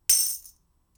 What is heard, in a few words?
Beat Ring, drums singles; The Roots Drumloops